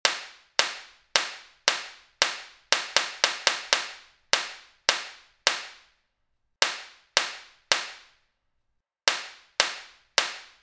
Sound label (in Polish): Bat